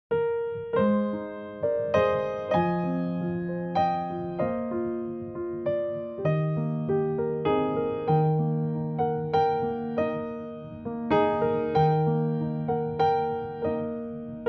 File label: Backing; iniciantes